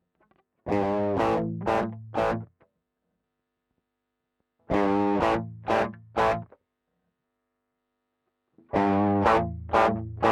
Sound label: tone up; ibanez ts-808